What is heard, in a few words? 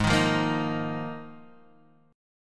Eb Suspended 2nd Suspended 4th Ab